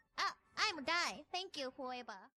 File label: vocals